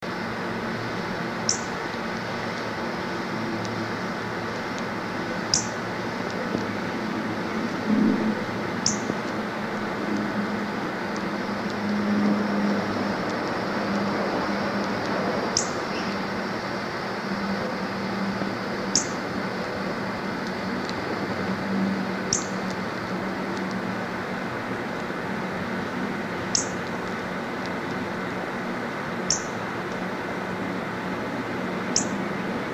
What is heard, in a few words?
Northern Cardinal song